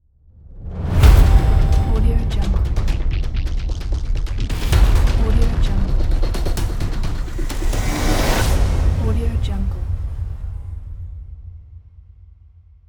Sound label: presentation logo